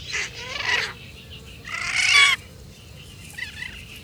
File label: frigatebird